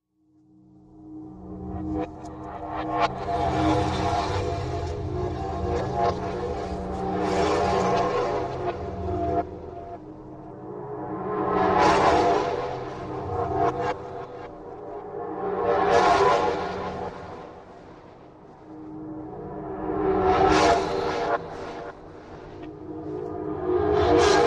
Reverse Calamity, Machine, Reverse Echo, Ambient Movement, Whooshes; Increasing & Reverses